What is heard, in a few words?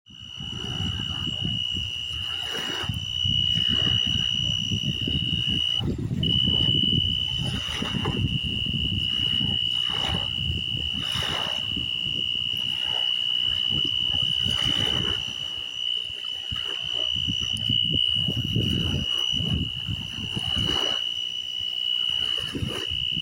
Mole Cricket